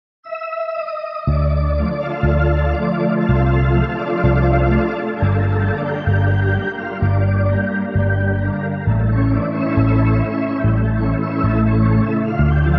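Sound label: Hammond Organ Wurlitzer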